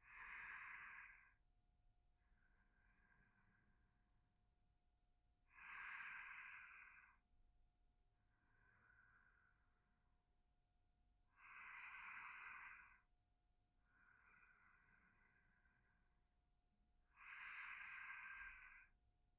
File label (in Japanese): 右吐息; 効果音なしバージョン